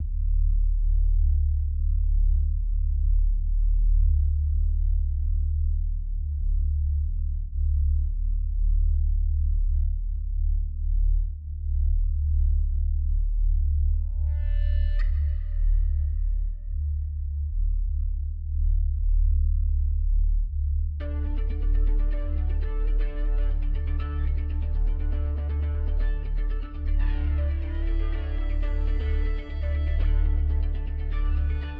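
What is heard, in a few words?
bounce 7 8, electric bass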